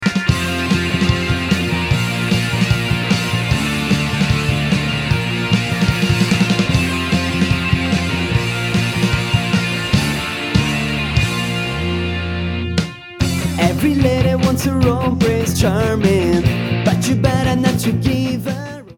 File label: pop